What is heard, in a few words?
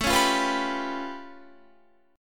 A Augmented 9th A, Guitar; 16,16,17,16,19,19; A+9 strummed